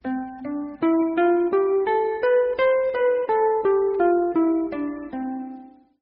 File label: Guitar Scale